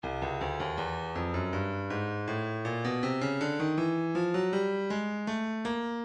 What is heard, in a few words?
breakbeat 080 bpm; modren c; Stylus Breakbeat Midi Files